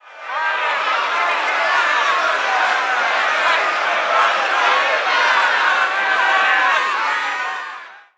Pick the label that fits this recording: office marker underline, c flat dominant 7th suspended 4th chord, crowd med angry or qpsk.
crowd med angry